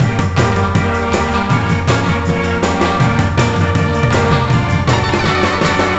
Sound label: Music Spirit Drums Intro; monster lab; nds